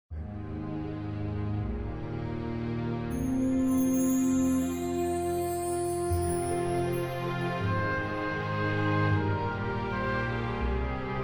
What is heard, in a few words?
orchestral theme; mdt music de; AVGN